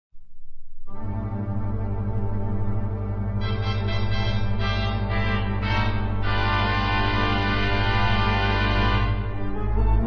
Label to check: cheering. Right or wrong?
Wrong